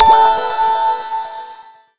Ambient short; Nokia; 9210 CD

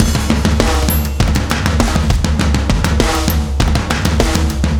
percu, ringtones; Thunderfoot; Google; NewWave Labs

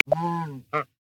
Antarctic Shag; Xiaomi Redmi-Note-8-Pro

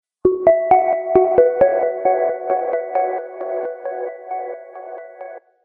particle, notifications

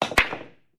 Billiards, notifications; LG Aristo 2 PLUS X212TAL

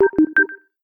Tones SMS; Pantech Discover-P9090

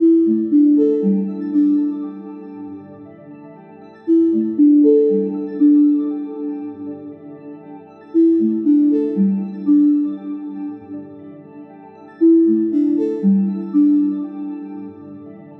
Soft Mind, ringtones

